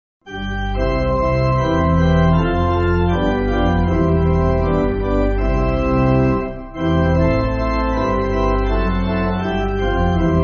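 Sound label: Pipe Organ, Backing Files for Churches; The First Passover; Wiesenthal; K.W. Wiesenthal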